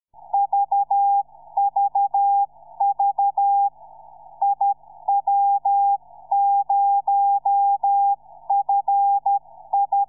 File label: beacon